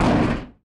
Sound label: car metal large impact; factorio 2.0.55